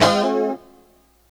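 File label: G 3 A mono slide L, guitars; Spectrasonics; Hans Zimmer Guitars VOL-1; 90's Sample CDs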